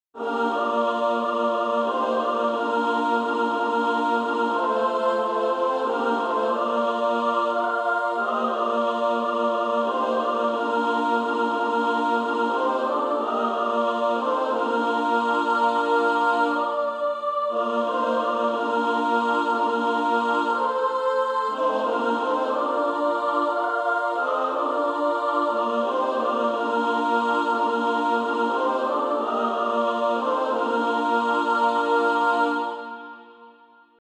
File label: Female Choir